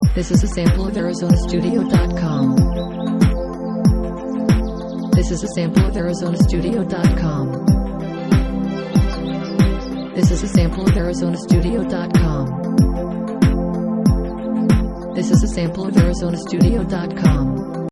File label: forest song